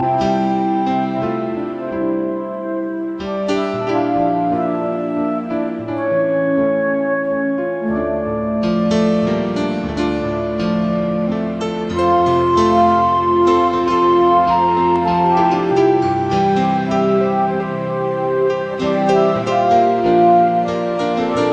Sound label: Instrumental